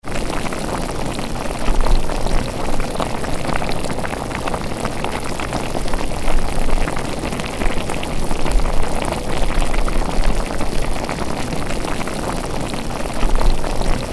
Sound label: gutsugutsu